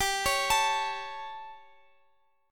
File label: G Suspended 2nd Flat 5th, Guitar; Gsus2b5 arpeggiated; JGuitar